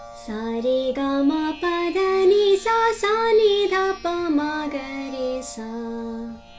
Kafi Aroh

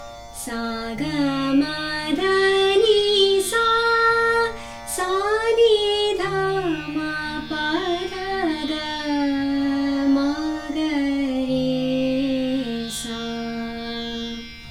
Bageshree Aroh, raag hindustani

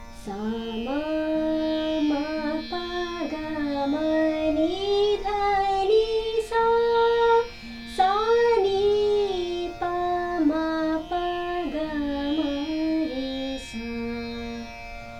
Bahar Aroh, raag hindustani

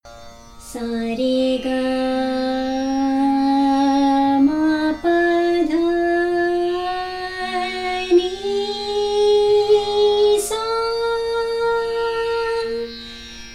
Darbari Aroh

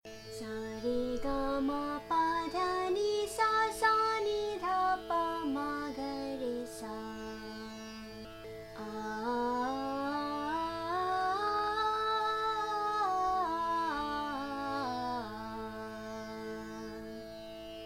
Sargam Single, raag hindustani

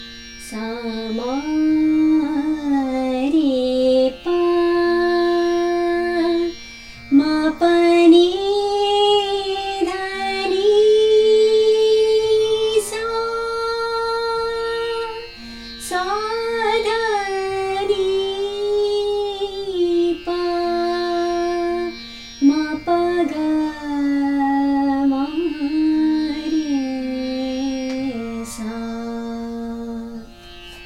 Miya Malhar Aroh, raag hindustani

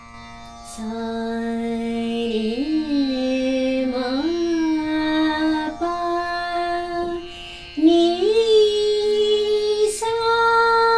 Megh Aroh, raag hindustani

